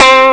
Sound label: elec guitar; ST-XX Amiga Soundtracker Sample Packs